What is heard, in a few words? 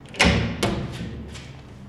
Jail Door Open; jail & prison doors